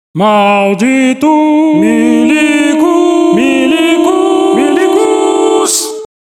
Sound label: ARPEGGIOS